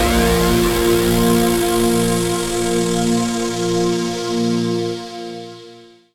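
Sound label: synthesizer Dance; Best Service Gigapack 1; SYN 06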